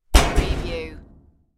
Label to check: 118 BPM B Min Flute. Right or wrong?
Wrong